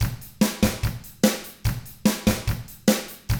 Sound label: rock drums